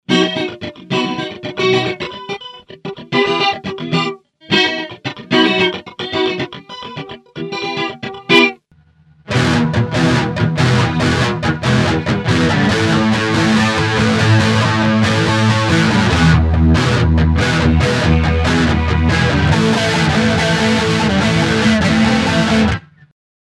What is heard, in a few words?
guitar hip-hop funk distortion